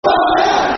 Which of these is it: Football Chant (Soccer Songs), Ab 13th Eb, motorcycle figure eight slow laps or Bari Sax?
Football Chant (Soccer Songs)